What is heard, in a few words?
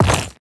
leather equip; inventory